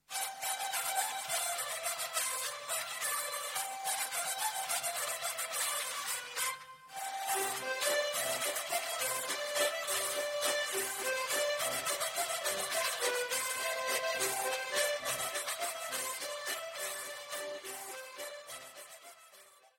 Bourree, France

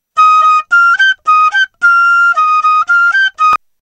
fifre; instruments

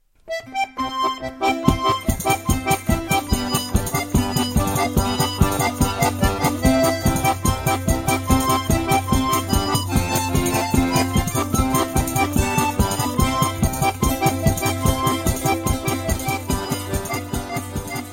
Polketta, Italie, Sicile

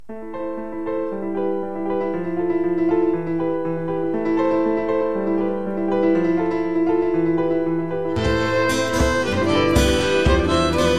Branle de Champagne, France

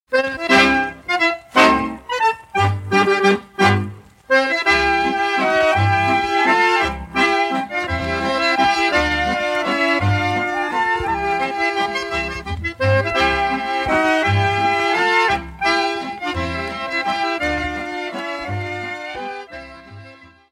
Autriche; Treffnertans

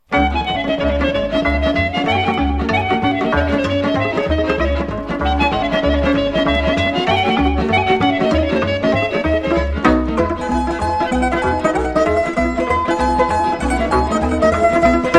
Sirba - Roumanie

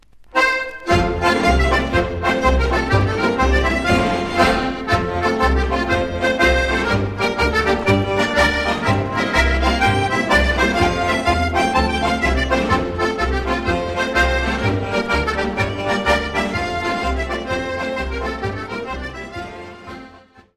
Two Step, Ecosse

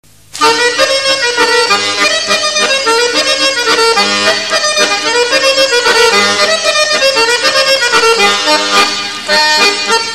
Kasapsko Horo, Bulgarie

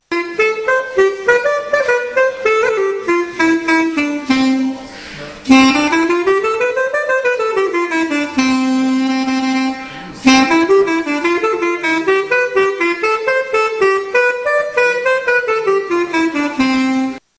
instruments chalumeau

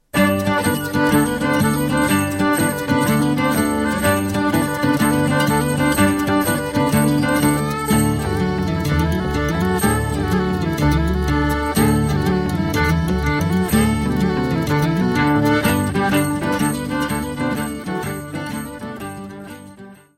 Branle Poitevin, France

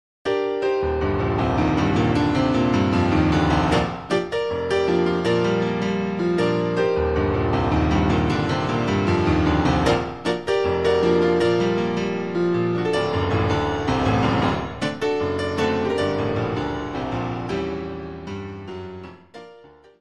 Rag, Etats-Unis

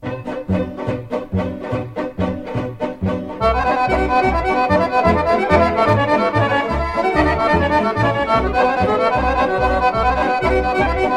Rucenita - Bulgarie; Zemenska Rucenita

